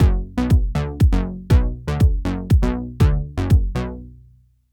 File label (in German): Arpeggiator punktiert